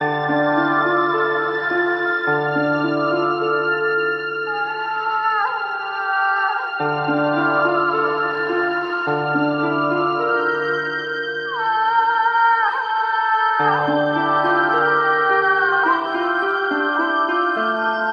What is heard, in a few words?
strings and voice, sad loop; loops